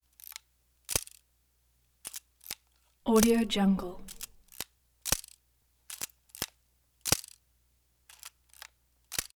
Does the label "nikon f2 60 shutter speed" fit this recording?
Yes